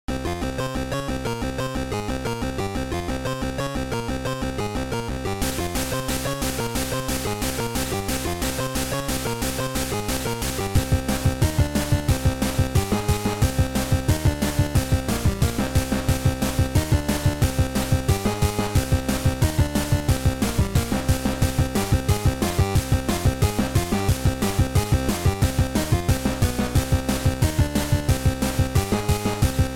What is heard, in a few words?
battle, bgm; asciinevrdie